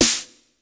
SN, Casio CTK-5000

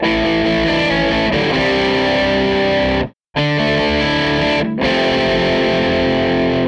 Les Guitar Loop

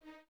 Violins D#4; Miroslav Vitous; String Ensembles; Detache

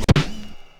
Broken Snare, Percussion Hits; Zero-G; Soundclash; Acidized Wavs

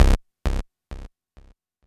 C1, SynthLine; 90 s Sample CDs; NiceBeats; SynthLine VOL-1

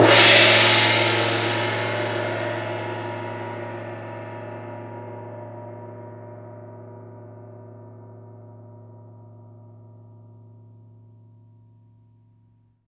CYM LRG, Gongs Ambo; Roland LCDP03 Orchestral Perc

